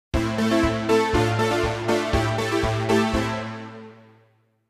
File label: Jingles; 90 DDD Jingles